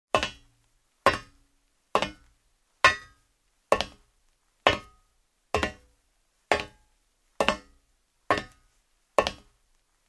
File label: Steps on the metal floor in shoes